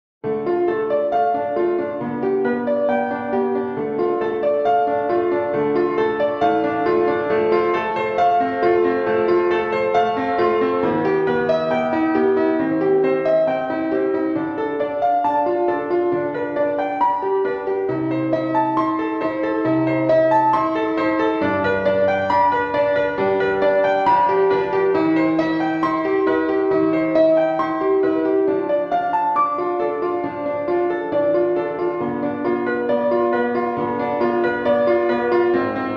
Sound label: 22edo Prelude; Porcupine Prelude 2